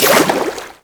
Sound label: Aqueous Discs Hit; Warframe; Yareli